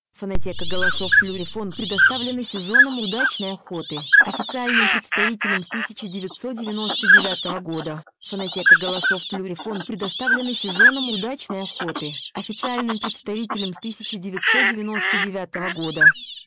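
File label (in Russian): шилохвость, манок; plurifon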